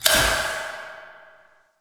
light on

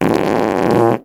fart

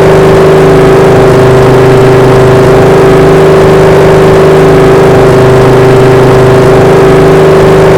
i5 diesel small, engines; acf engines

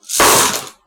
methcrate fill

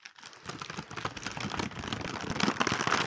lantern unfold

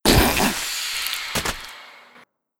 explode

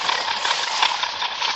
grind loop

